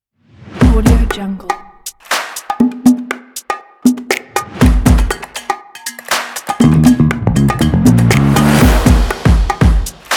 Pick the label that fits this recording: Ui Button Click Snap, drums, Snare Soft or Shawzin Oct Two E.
drums